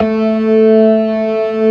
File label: ORGAN COMBOS; Keith Emerson Signature Series